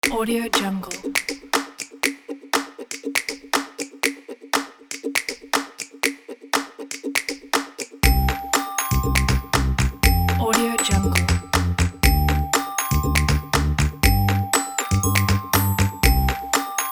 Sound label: claps ukulele; blueeagleaudio